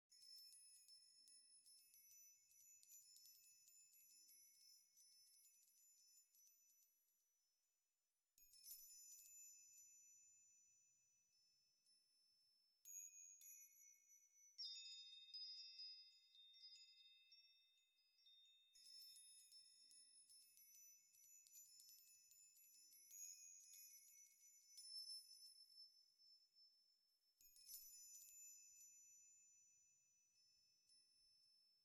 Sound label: Death & Rebirth Ending Pad